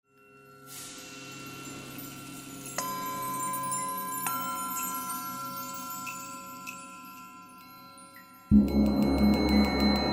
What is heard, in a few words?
zen far east sounds